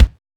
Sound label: bass